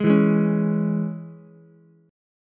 Ab Suspended 4th Sharp 5th chord, Ukulele; 1,4,4,4; strummed; JGuitar

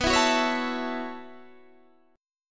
B 9th Suspended 4th B down

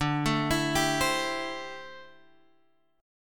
D 7th Suspended 2nd Suspended 4th, Guitar, Open G

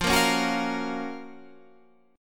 Gb Major 7th F, guitar

